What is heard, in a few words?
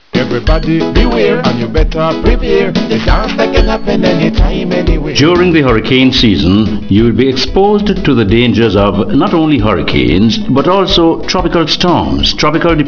jingle